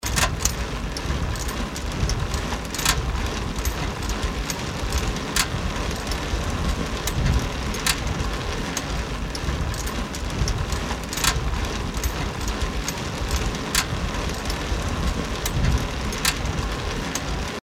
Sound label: bicycle run